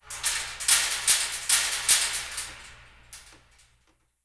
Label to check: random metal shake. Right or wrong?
Right